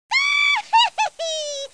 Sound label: Hee; Bible Fun Pack; NOAH